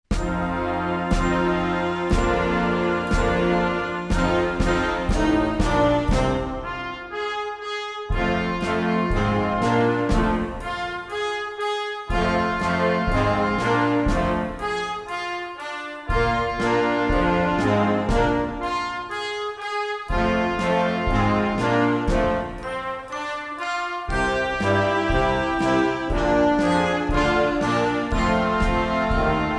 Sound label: plain blues